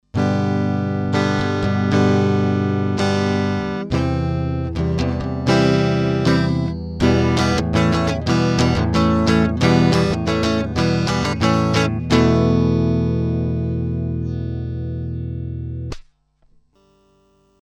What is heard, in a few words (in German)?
GAP Pre 73 DLX Premier Gitarre DI Gain 20 HP 0 LOW Z